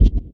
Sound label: One Shot, Hits; One Shots; Touch Loops; ElementsOne; Abstract Rhythms